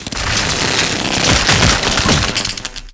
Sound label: shockwave tell